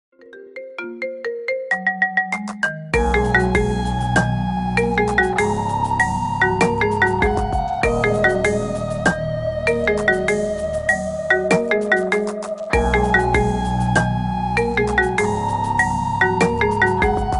marimba ringtone remix

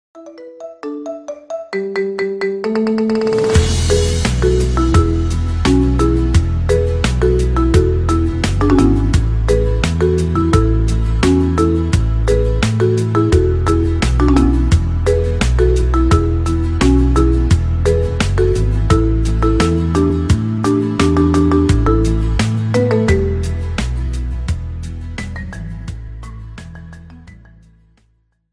marimba ringtone remix

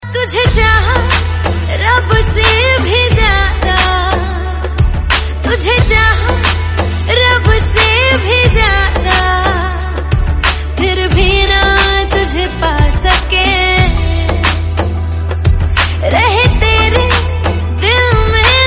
female version

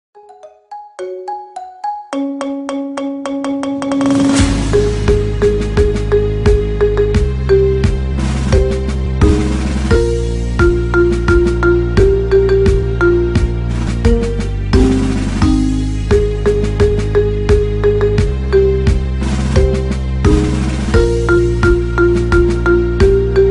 marimba ringtone remix

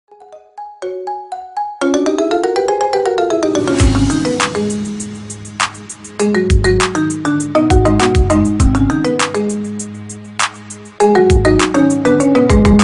marimba ringtone remix

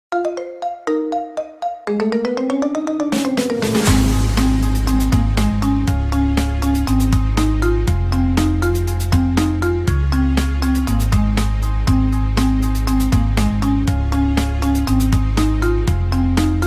marimba ringtone remix

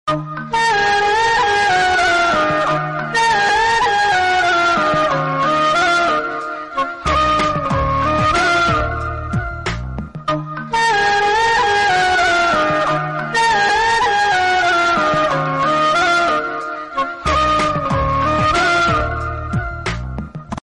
romantic lovely tone

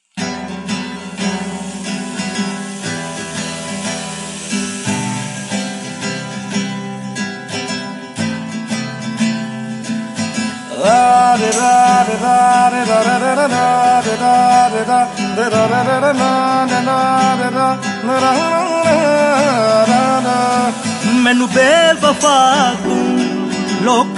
ring

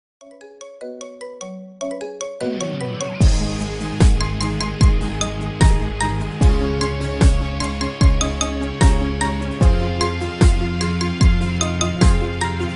marimba ringtone remix

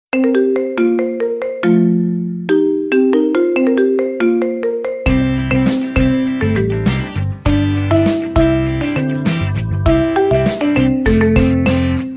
marimba cute

